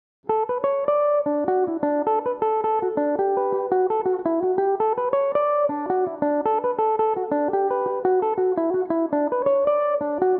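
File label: D major, Irish mandolin; daniel o connell; belmuse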